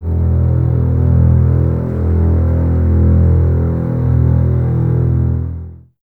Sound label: BSS E 1, String Ensembles, Basses; Lo+Top; Miroslav Vitous; 90 s Sample CDs